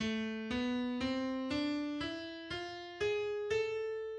Gamme la mineur harmonique

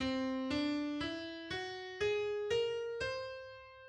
Gamme par ton

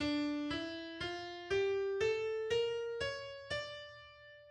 Gamme re mineur harmonique

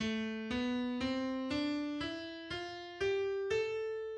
Gamme la mineur naturel

